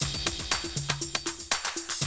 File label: 120, Classic Drum Loops